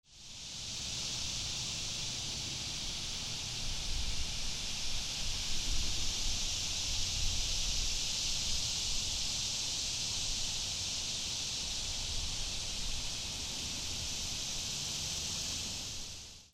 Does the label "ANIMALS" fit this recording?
Yes